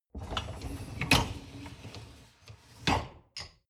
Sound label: dishwasher upper tray draw out